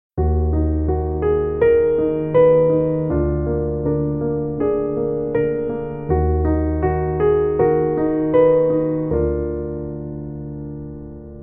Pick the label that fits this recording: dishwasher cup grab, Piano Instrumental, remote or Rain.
Piano Instrumental